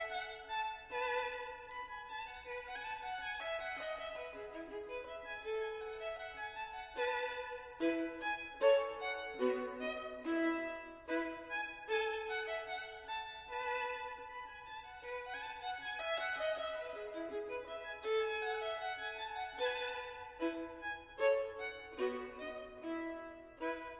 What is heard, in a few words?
Gavotte en rondeau, Violin